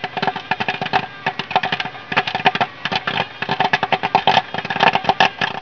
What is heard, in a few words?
popcorn